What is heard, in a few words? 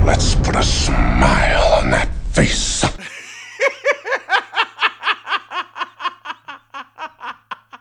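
Joker Laugh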